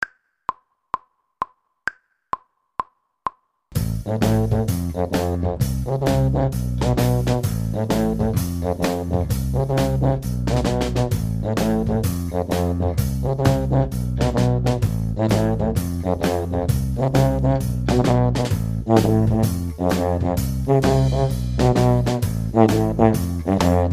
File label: tuba section